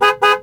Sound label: horn